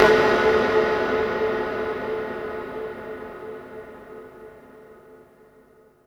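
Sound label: percussion FX, Dance Drums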